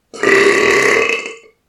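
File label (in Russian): рык озверевшего монстра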